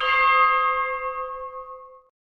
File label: bell; hf arsfest